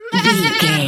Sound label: sheep baa high